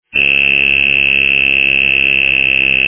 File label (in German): msr s2, armbandwecker